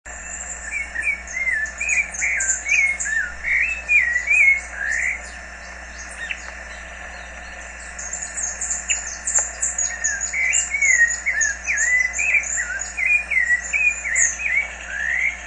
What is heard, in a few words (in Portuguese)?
laranjeira, cantos sabias; casinha